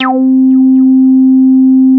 Synthesizer Middle C Notes, Lead; SYNTH KEYS ETCETERA

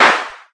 rifle, VIDEO GAMES; carnival

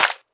clap; Claps

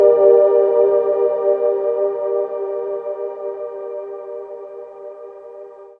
nice minor breathy chord, Pads

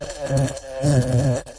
Electro FX; Ueb HE Electro FX

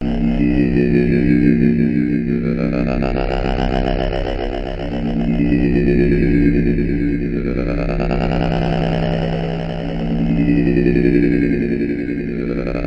BREATHER SHORT; Studies in MASS

